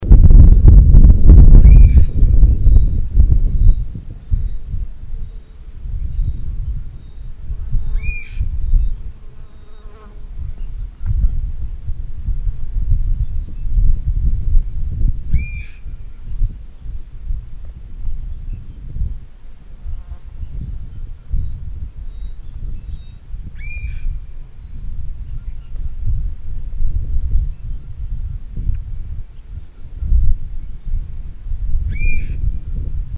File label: Chinese Bamboo Partridge Call